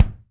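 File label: BD, bass drum; Best Service Gigapack 2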